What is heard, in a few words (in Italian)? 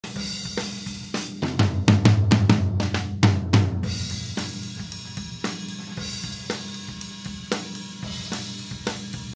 TOM senza gate; Batteria